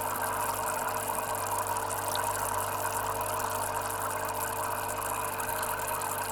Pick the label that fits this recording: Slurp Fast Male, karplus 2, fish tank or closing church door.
fish tank